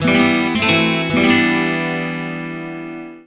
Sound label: Game Over; Dillon's Rolling Western The Last Ranger; Nintendo 3DS